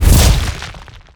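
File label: Se Dungeon Door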